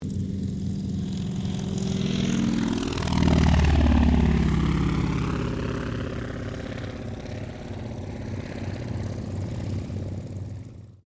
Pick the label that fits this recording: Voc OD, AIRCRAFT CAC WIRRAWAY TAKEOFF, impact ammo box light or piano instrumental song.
AIRCRAFT CAC WIRRAWAY TAKEOFF